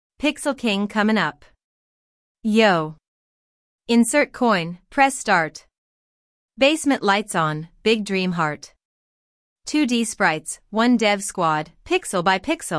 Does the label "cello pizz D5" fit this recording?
No